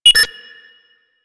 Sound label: Sniper Rifle Lock Lost; Grineer; Gorg